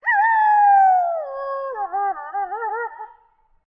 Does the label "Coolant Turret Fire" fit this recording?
No